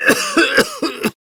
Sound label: Coughing; PTSD; Warframe; Evacuation